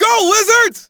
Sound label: "Wizards", fan; NBA Inside Drive 2004